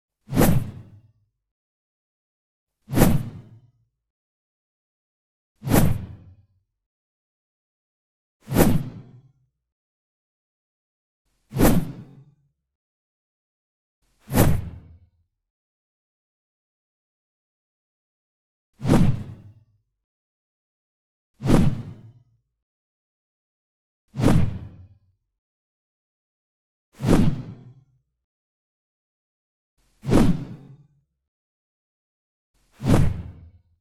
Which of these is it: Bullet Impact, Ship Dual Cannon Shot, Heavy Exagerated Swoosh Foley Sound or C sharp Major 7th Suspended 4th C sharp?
Heavy Exagerated Swoosh Foley Sound